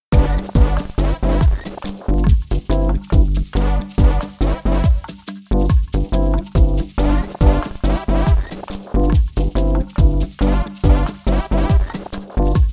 Conversation Screen; bullet girls 1 2 soundtrack

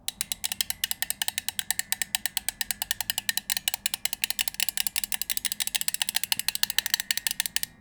Close Rewind Of Metronome And A Music Box

